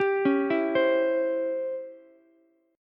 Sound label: Db Minor Major 7th Flat 5th Db down Ukulele; DbmM7b5 arpeggiated